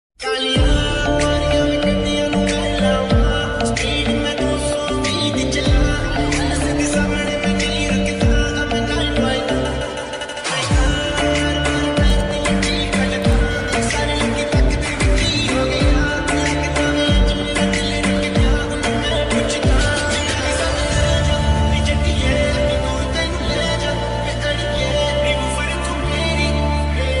attitude ringtone bgm ringtones english ringtone bad boy